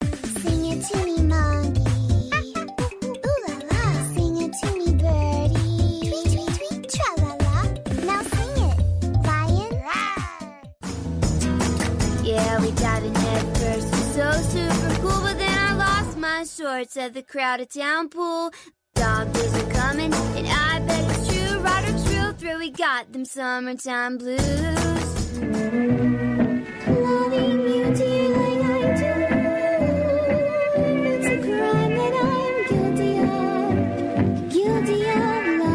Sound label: Singing